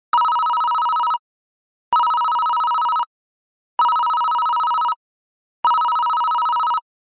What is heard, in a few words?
call